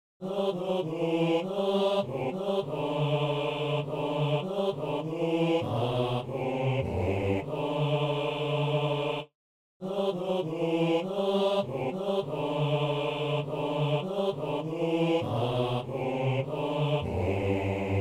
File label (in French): basse; Aussitôt que la lumière